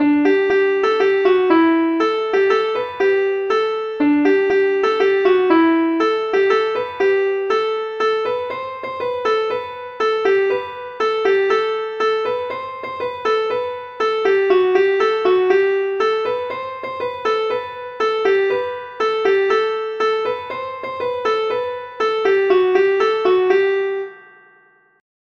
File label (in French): suite larides anciens